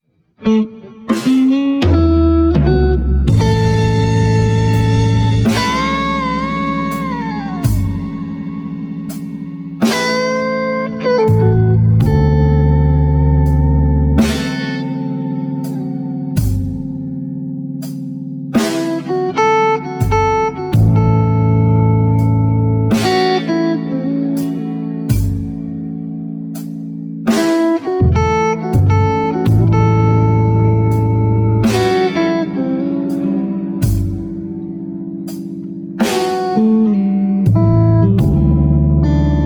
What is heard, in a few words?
blues licks